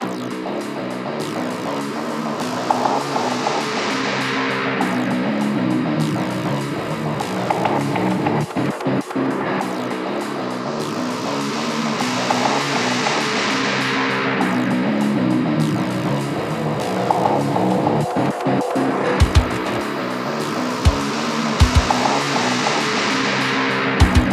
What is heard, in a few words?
Music Song Intro; Hellbound